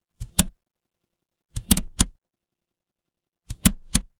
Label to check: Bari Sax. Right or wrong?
Wrong